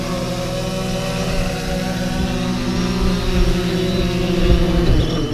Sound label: drone sound